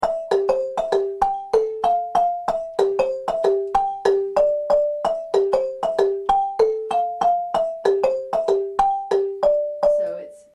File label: soprano, marimba; Variation 1; babamudiki; First soprano